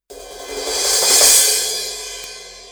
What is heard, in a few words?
sabian 18 aax dark crash